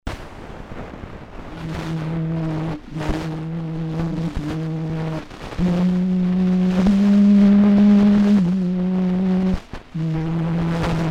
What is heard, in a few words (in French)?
4 voix